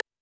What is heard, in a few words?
percussion, Roland TD-20